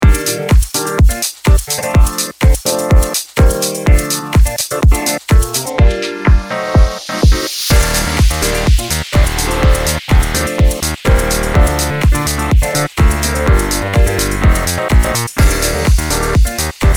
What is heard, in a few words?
House Beat